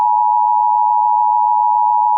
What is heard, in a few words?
tv noise; ambience